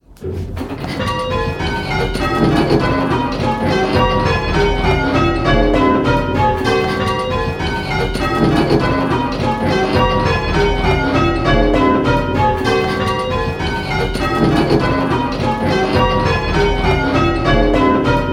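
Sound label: Bells ringing, Treble too close at hand stroke; Pebworth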